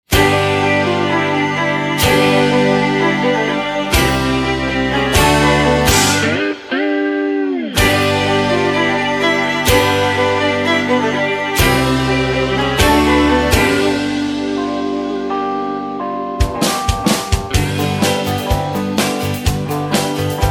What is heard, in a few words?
No Backing Vocals, (G)